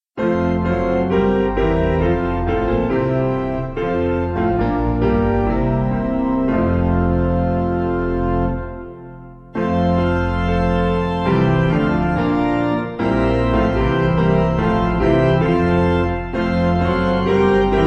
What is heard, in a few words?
Whosever Anywhere Open Door Piano